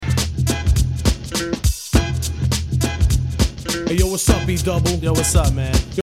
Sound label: Beat